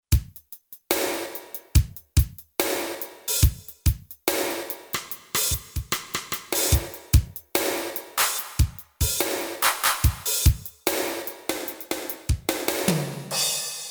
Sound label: Rhythm, Roland JV-880; Factory Patches; Preset A Rhythm demo